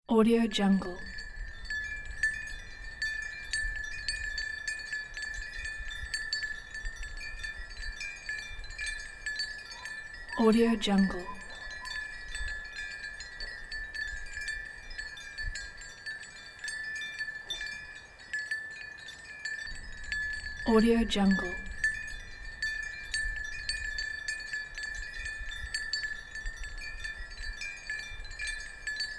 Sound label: cow bells